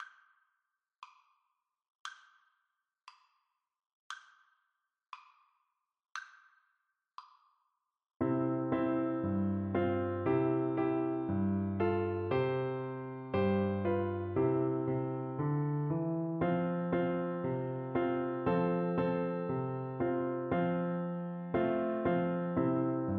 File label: do lord, oboe